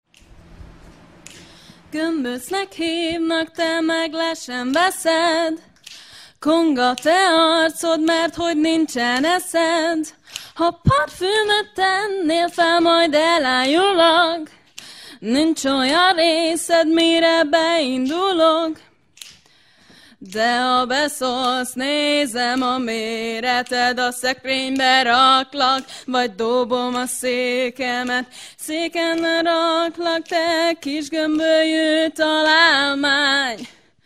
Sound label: bigband; 2004 balatonfenyves